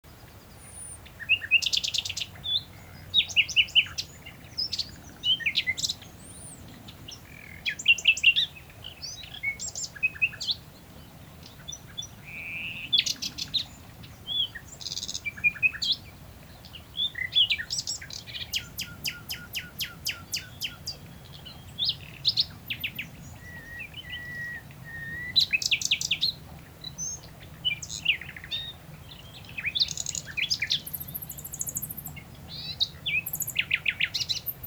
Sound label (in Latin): Luscinia megarhynchos; SETE